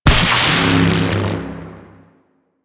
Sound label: Infested Arc Rifle Fire Shot A; Warframe; Weapons